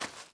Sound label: fs sand, footsteps